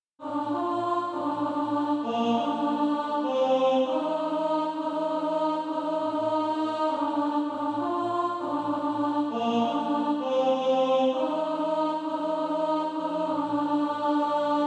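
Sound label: alto, SATB Practise Music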